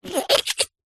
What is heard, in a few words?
cartoon wet slime rubbery pull squeeze